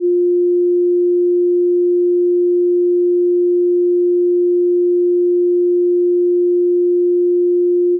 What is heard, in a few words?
festival drop, edm. big drops